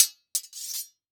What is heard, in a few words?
Impact Sword Impacts